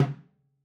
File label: timbales Low Mute; Raw Perc One Shots; Slate; SD SEISMIC Drum Sample Pack